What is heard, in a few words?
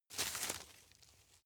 grab crunch short medium